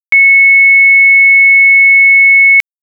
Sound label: long tone 2200 Hz